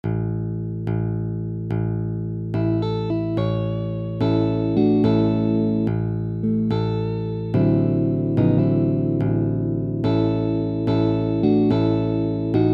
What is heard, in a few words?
12-Bar Blues with 9th and 7th chords